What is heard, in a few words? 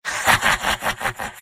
laugh evil; engineer; video games; source engine; tf; mvm